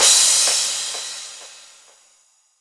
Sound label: Crash, 128 BPM; Vengeance Samples; Vocal-Hook Kits; Song Kit